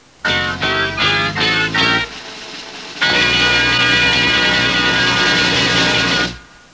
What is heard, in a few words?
fanfare